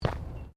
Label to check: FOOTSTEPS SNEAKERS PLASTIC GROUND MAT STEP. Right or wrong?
Right